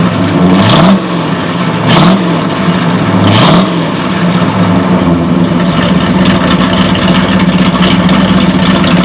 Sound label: Ford varoom; Galaxie